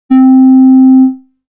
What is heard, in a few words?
Solar-Flute B